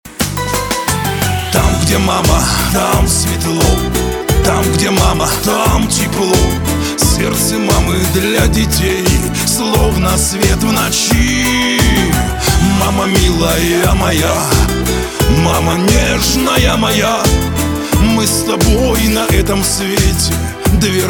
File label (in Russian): muzhskoj vokal